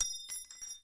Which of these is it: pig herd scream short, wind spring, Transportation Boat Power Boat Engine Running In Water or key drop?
key drop